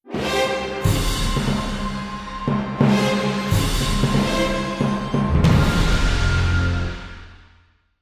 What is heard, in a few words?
sting vampire turn; The Sims 3; windows gamerip 2009